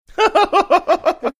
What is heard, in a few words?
cute laugh